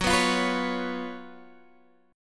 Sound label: F sharp Major 7th Suspended 4th, Guitar Standard; strummed; x,9,9,10,12,9